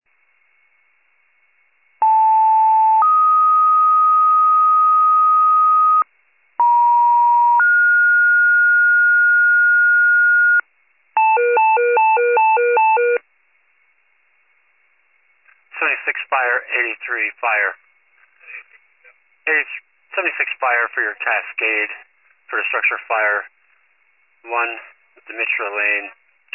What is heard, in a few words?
fire, pager alert